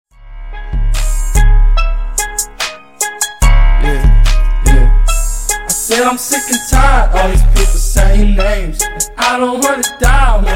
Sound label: Intro Outro 73 Bpm